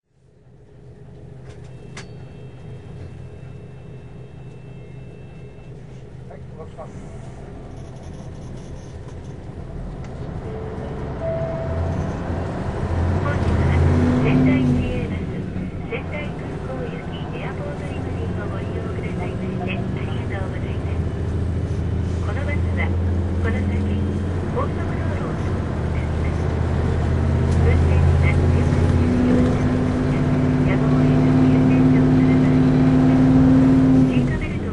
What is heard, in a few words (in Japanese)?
走行音, 三菱 U-MS726S; 仙台市交通局; 川 内; 長町一丁目→仙台空港